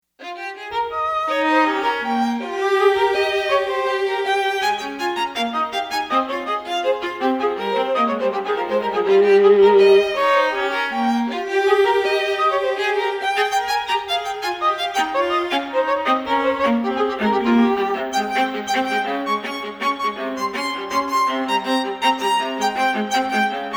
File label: Duo Violin Viola